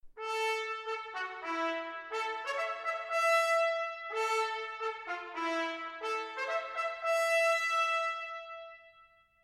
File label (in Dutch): trompet